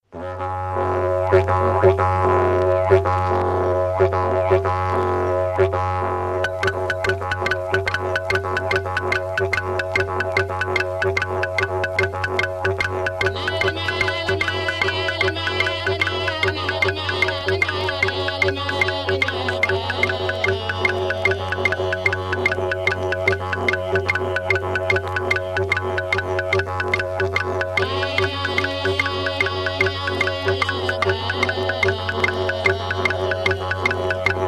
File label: aboriginal, didgeridoo